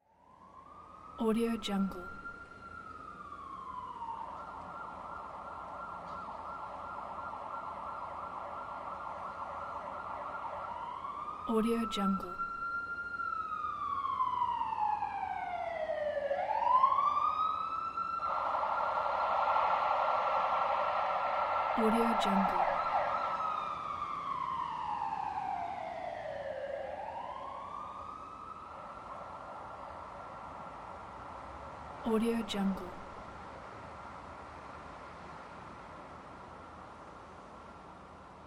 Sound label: police siren ambience